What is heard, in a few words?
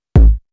909 thug kick